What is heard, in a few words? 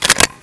cylinder out, weapons bull